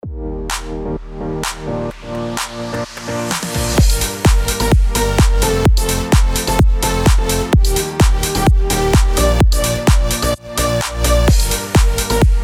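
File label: HOUSE G minor 128BPM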